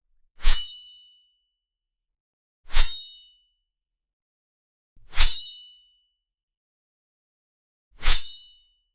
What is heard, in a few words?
sword swing long sound